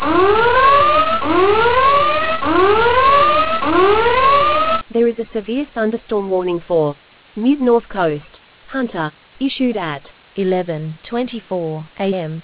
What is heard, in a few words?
storm audio